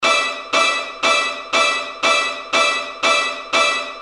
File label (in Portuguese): efeito de som de suspense